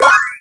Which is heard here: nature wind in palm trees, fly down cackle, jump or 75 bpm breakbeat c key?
jump